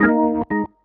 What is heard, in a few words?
ORGN 123, Keys; Houseworx